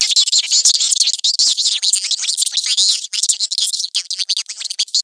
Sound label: mobile phone; gorge